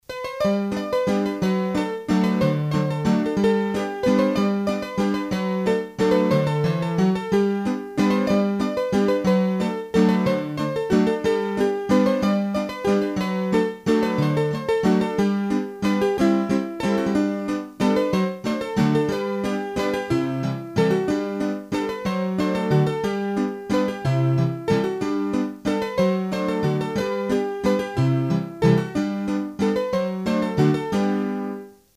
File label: Mazurka, hurdy gurdy; Massif Central; Mazurkas